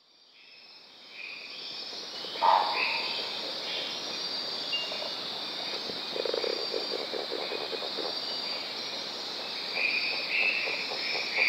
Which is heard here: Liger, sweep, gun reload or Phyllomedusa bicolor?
Phyllomedusa bicolor